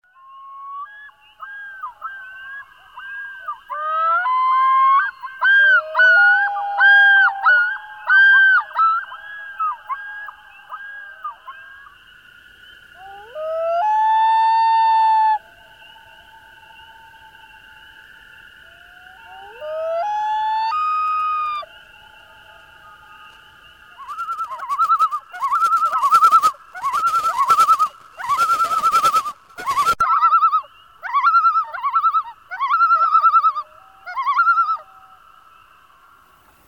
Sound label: Common Loon, bird calls; Envirothon Jr. High Resources; Jr. High Enviro Wildlife Resource Packet